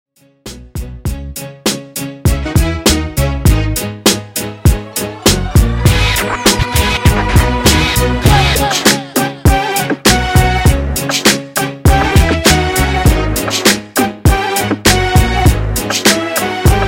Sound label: 100 Bpm; Mashup